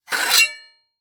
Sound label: Sword Scrape On Stone, weapons; AdobeCC